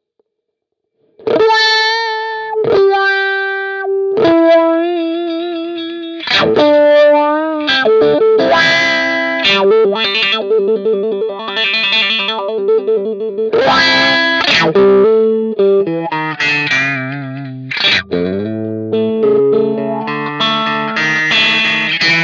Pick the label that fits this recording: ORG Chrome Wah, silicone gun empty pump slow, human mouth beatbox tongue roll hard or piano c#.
ORG Chrome Wah